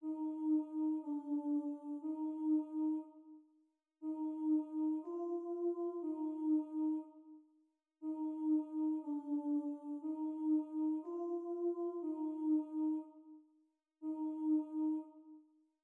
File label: buzzing exercise, trumpet